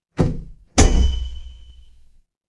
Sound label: office elevator